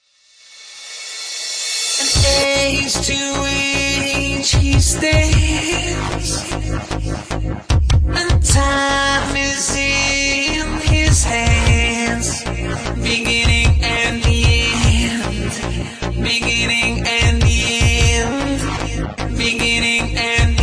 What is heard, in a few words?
dubstep remix